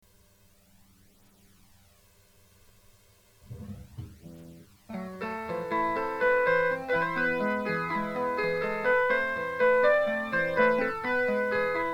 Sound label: three chord chorus